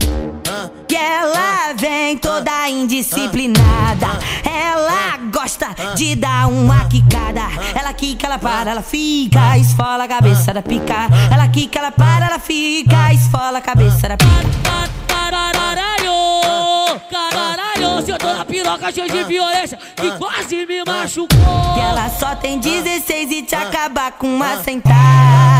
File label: funk bass